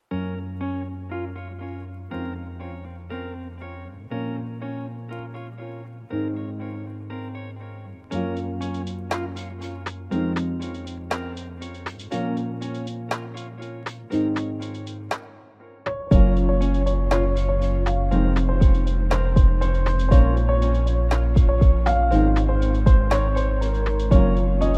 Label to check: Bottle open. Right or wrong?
Wrong